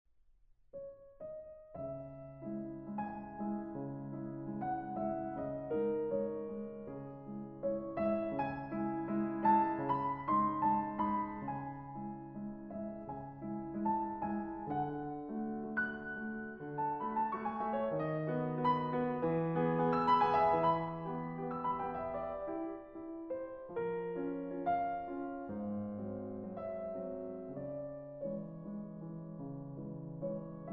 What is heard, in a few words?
Preludes in C-Sharp Minor